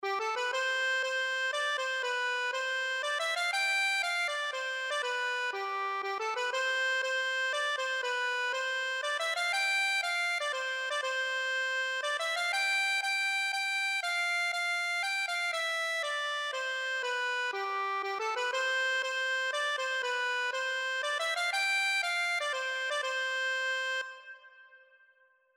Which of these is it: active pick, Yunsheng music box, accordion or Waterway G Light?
accordion